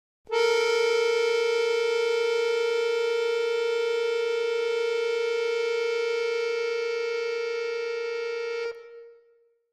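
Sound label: Accordion Keys Tension; keyboards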